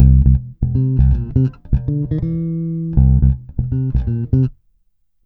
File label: C, Bass, 81 BPM; BACK JACK; Sampleheads; Ultra Freakin' Bass